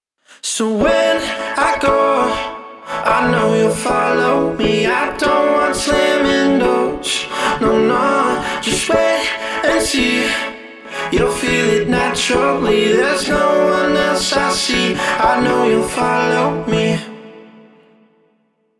Vocalsynth

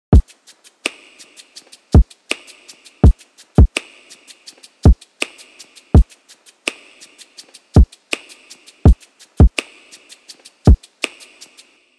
Kick Snap Disted Snap Shaker

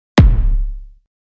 Kick

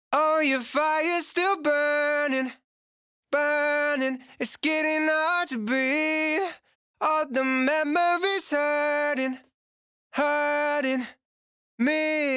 Vocal wet

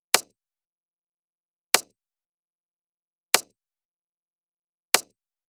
Kick EQ

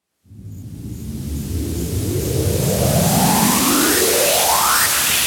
UPLIFTER 128BPM

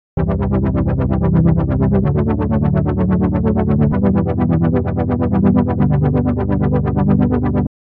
lfo

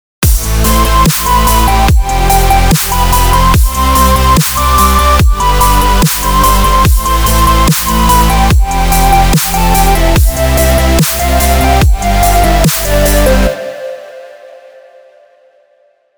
Melodic Dubstep

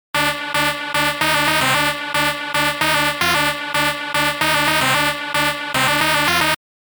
Lead

